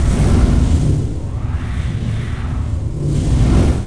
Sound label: fire gath; DAoC; Toa; Gamestar